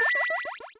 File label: match